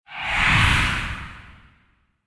Heart Strike; Deathknight; Spells

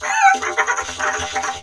Rocket Chicken

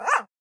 Pug Dog Clickable

